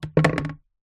household waste paper basket plastic empty knock over wood